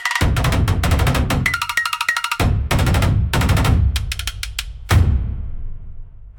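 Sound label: taiko scene transition, jingles; free jingles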